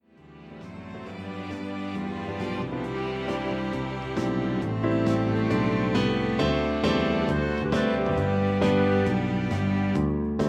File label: live version 2 part or 3 part song for treble voices